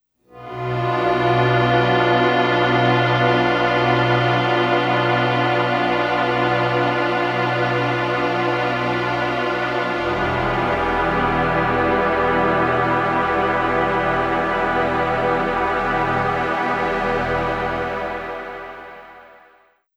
Big Chords; Chords & Washes

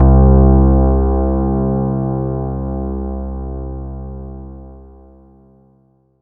Oscar bass; Big Fish Audio - First Call Vintage Keyboards; OSCARBASSICS

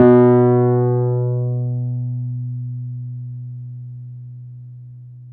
PNO, Wurly

